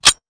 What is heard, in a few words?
weapon foley pickup; Real Guns Sound Pack 2; Mono